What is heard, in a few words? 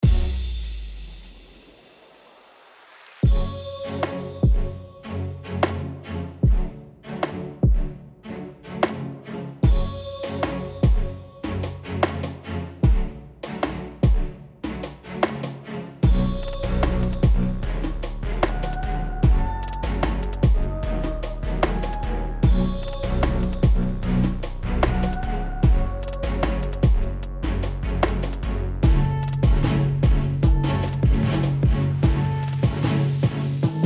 Classical EDM Record